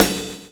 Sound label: PULL; Spectrasonics - Backbeat